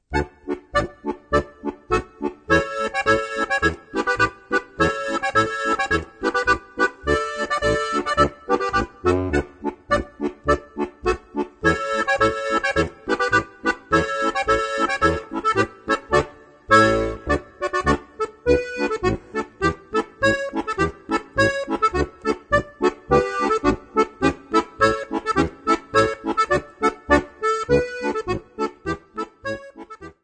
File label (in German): Mühlrad Polka